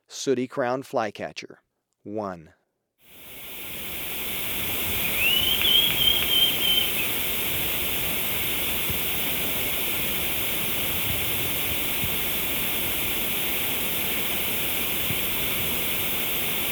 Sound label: Sooty-Crowned Flycatcher, Birds of Ecuador; S. America